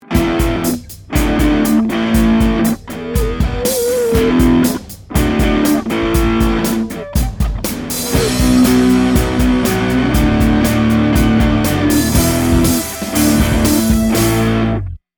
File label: Mini Marshall